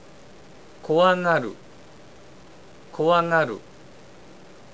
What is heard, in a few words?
scared; japanese